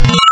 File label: FFT SQUEAL; Digital; Synthetic's Serum Collection